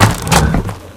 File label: upgrade stone